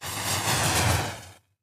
Matches Book Lit; lighters & matches

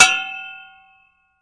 Impact Vari Metal, crashes

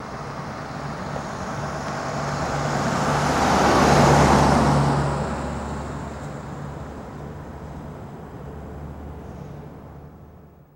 Car Compact Drives By

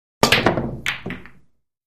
Billiards Classic

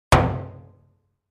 Metal 50 Gallon Drum, barrels buckets & cans

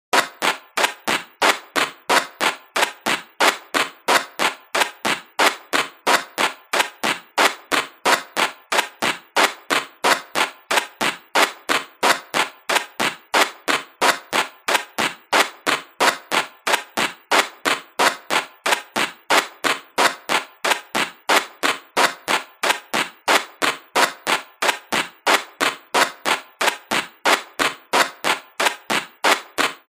Ticking Electronic, ticks

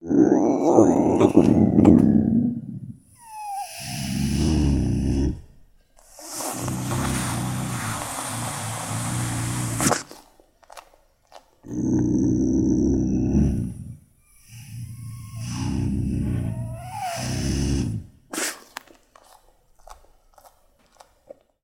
Bobcat Snarl Low Grun; big cats

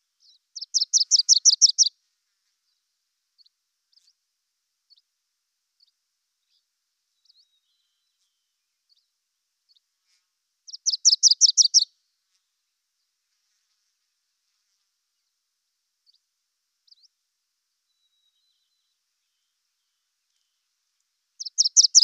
Warbler Wilsons Call

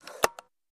Door Inner Snap Shut

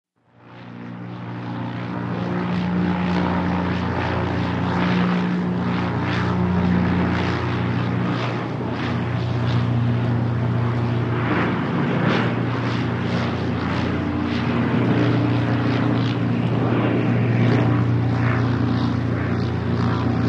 Goodyear Blimp Stdy F

